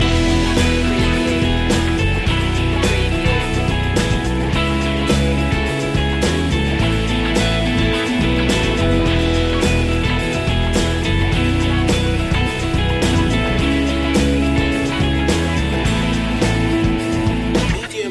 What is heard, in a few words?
bouncy beat loop